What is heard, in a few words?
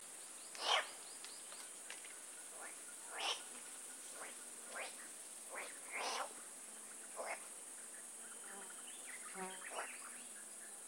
Guinea turaco